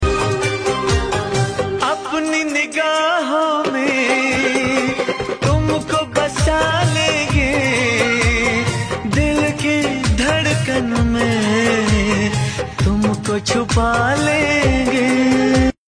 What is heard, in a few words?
Ringtone